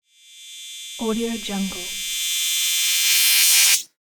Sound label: neo laser transition